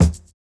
Kick, jungle breaks drum sounds

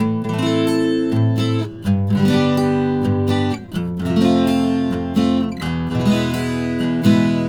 Acoustic Latin Guitar 128BPM Amin; Vengeance - Essential Clubsounds Vol.4; Guitarloops

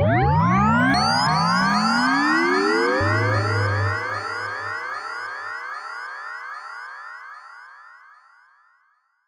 Virus LFO Sweep

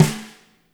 FAT SNR, DRY KIT; Spectrasonics - Burning Grooves CD2

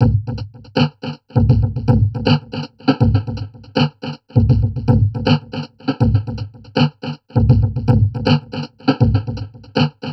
Drum Loops Crazy Processed

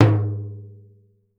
REPIQUE; Definitive Percussion

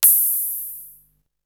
Open hat; ZERO G - Datafile DISC 3

